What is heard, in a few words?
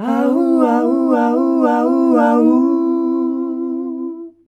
HAAUHAAU, MALES; Best Service; Hyper Dance